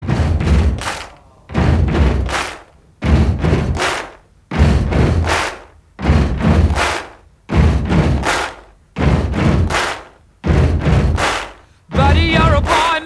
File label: rock